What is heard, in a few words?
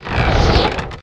freeze; game